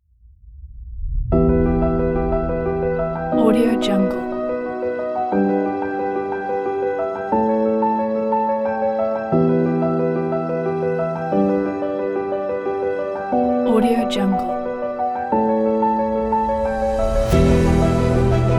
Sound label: emotional piano